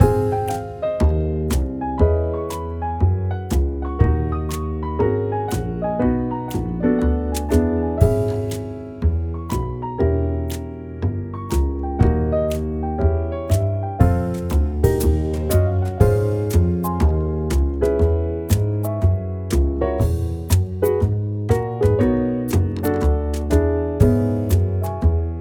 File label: Lobby Loop; Good Job